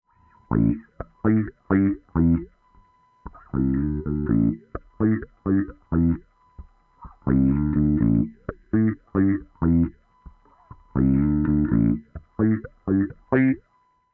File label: wahoo human; Sonuus